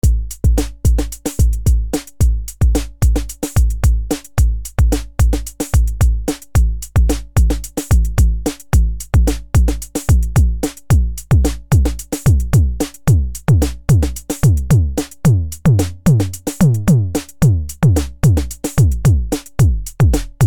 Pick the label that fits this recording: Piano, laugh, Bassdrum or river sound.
Bassdrum